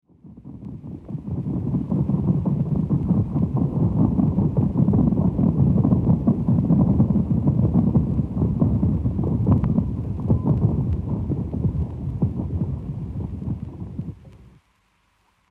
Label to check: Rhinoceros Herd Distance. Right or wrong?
Right